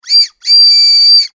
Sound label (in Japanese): フエフキラムネ